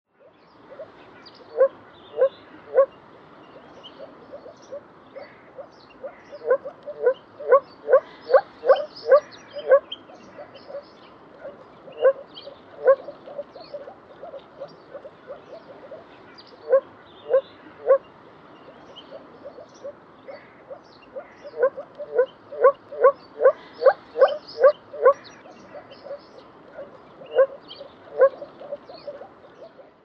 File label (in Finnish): viitasammakko; sammakkolajit; janerikbruun